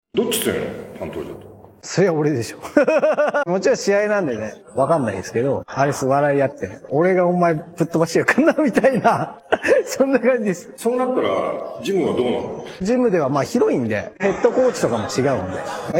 vocals

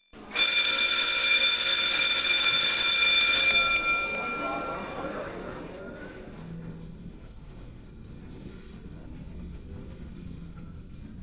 bell no vocals; bell 2nd floor

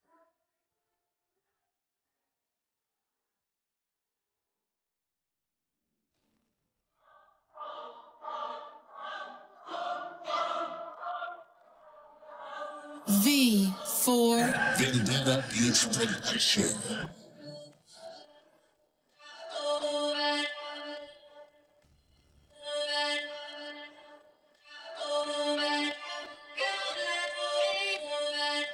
aggressive rap vocals; hip hop

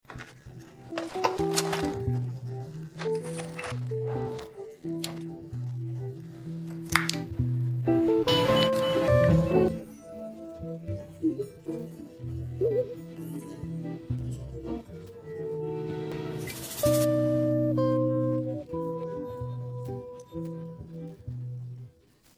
no vocals

